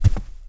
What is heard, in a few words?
chair Drop; objects; Star Wars Obi-Wan; XBOX